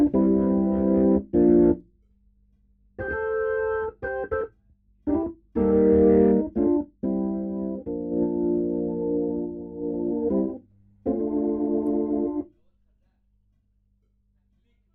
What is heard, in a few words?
HAMMOND BOT